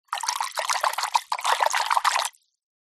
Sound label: household water in glass jug splash agitate fingers fast; zapsplat